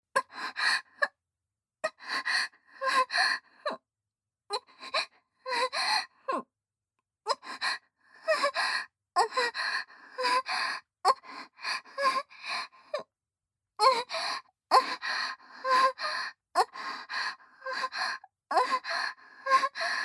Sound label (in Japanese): 正面近い・喘ぎ声・普通; リーシャ